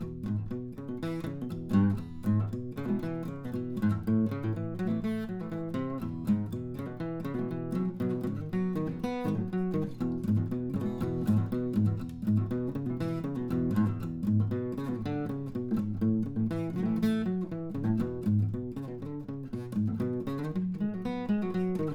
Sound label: blues E 120